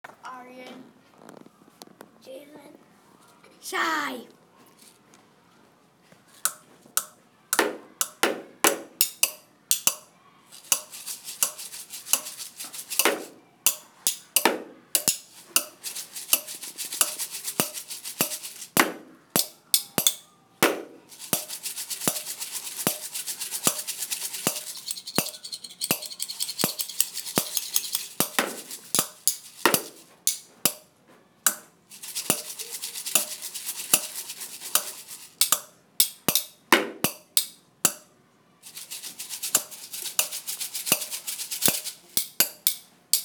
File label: found instruments